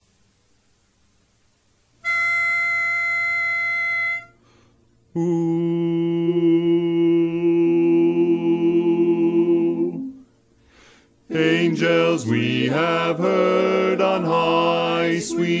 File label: Bass